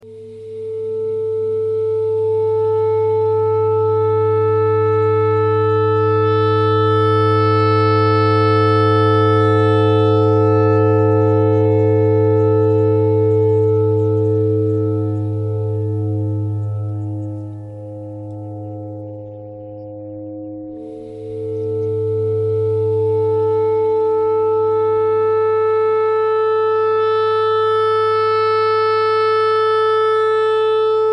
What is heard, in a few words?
clarinet notes e clarinet thread noise